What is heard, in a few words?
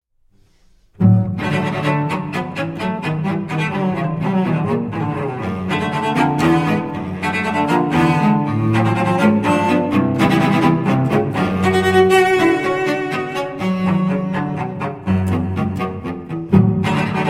violoncello